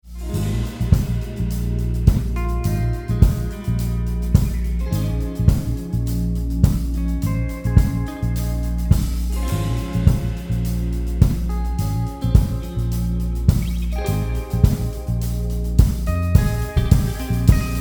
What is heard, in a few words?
Instrumental